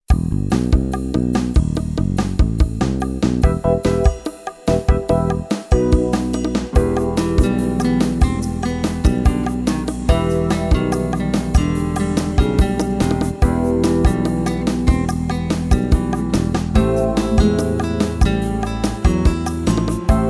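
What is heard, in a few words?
Salsa, Guitar; Gitaarboek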